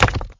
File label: Cat launch timer last